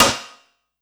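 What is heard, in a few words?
snare drum, Drum & Bass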